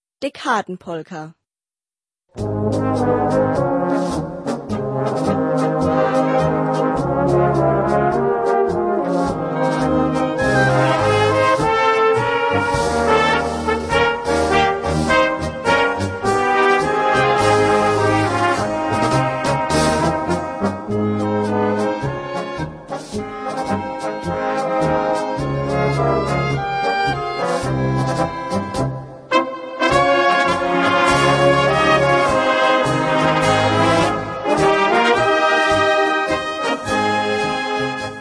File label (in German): Polka